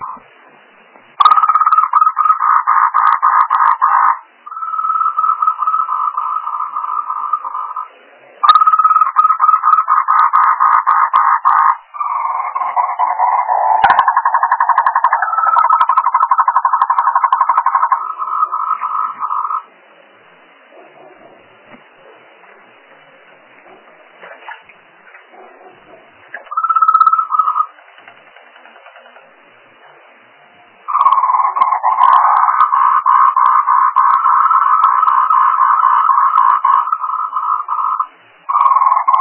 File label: frog